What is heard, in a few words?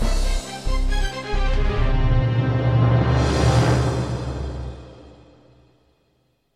Fanfare; Millionaire Soundtrack